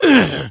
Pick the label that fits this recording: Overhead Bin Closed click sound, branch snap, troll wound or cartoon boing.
troll wound